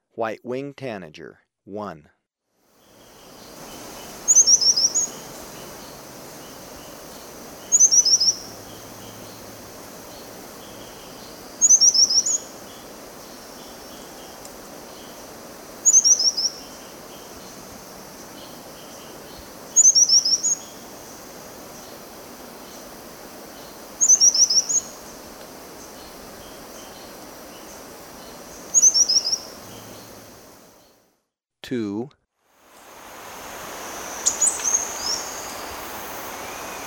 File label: White-Winged Tanager, Birds of Ecuador; S. America